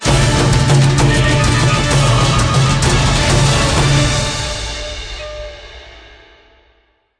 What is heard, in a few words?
outro Free Spin; warlords